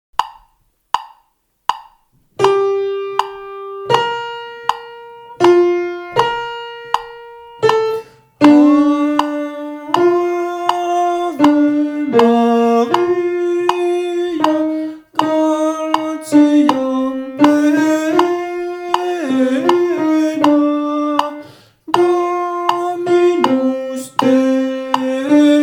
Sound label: ave maria alto